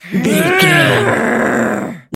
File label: voice female effort growl